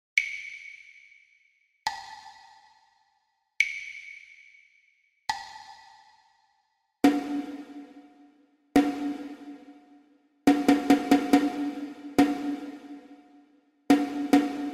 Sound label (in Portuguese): percepcao ditado ritmico